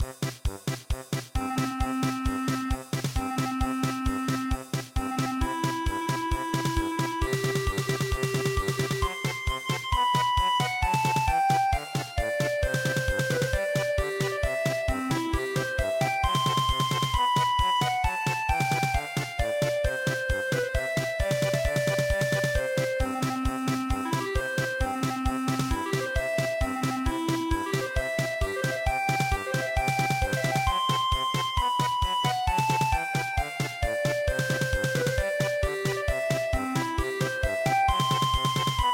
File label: polka